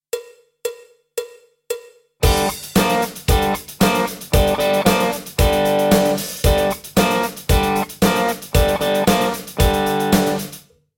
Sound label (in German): Rock Lick, E-Gitarren